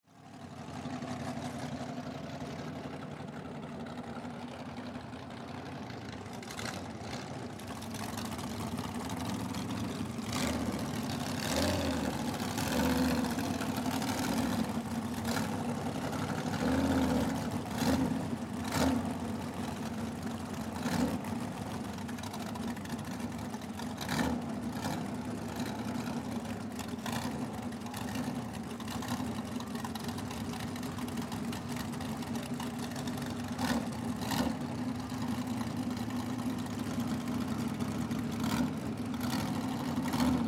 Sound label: Swamp Jeep Idles Rev; Transportation Watercraft; Boat Sound Effects; Boats (Sound Effects:Vehicles); Blastwave